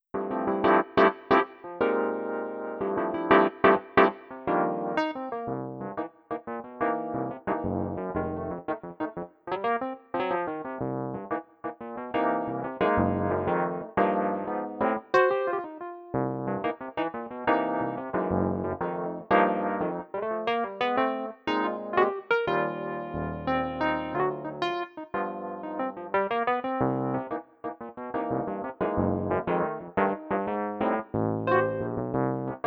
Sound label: Vintage EPiano, pianino cyfrowe; Yamaha NP-15 WH